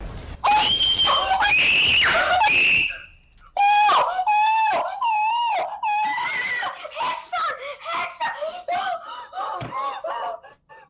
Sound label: aaaa